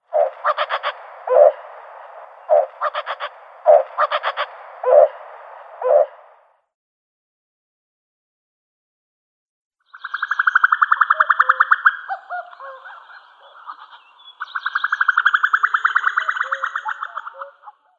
Common Cuckoo male

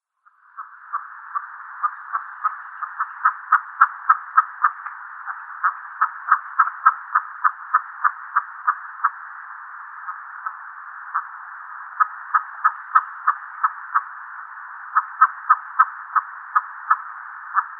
Capercaillie female calls

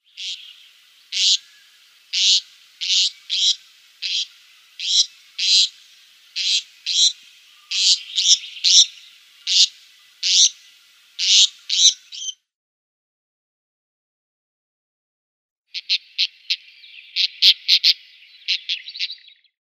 Black-eared Wheatear calls

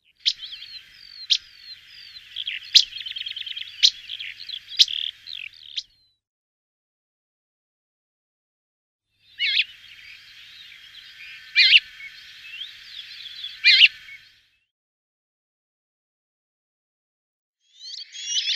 Rock Sparrow calls

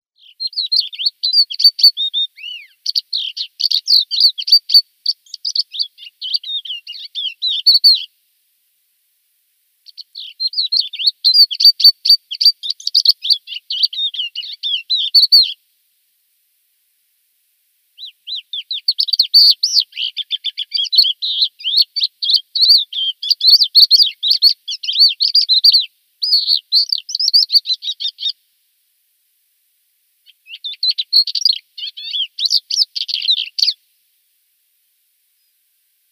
Thekla Lark song

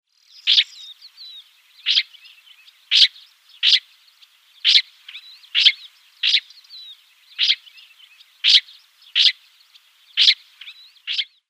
Lesser Grey Shrike call

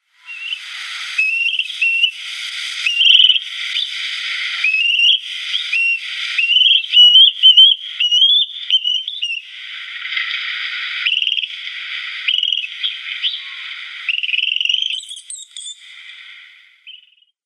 Common Crane young

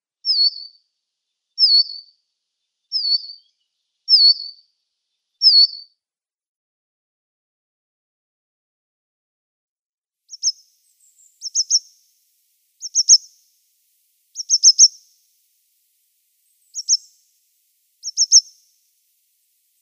Coal Tit calls

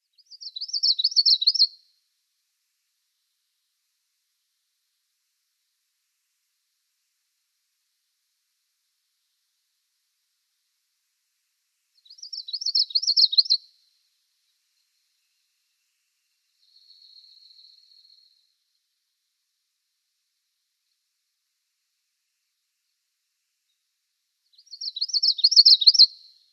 Common Yellowthroat song

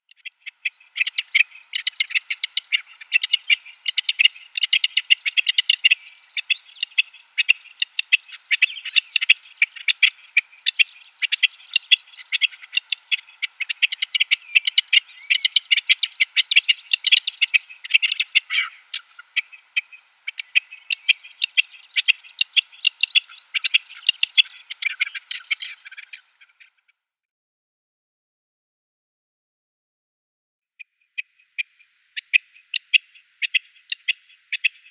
Rock Partridge male display

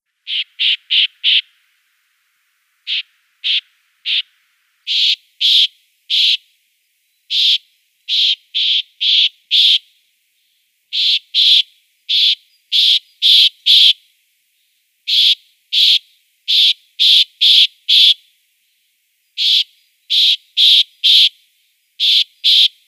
Corsican Nuthatch song

